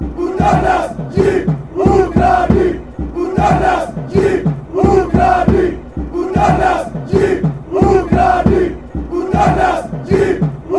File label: greece chant